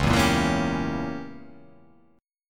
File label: C sharp Diminished 7th C sharp down